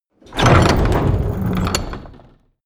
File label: door metal open